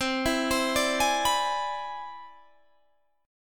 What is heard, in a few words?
C Augmented Major 9th C down Guitar Drop D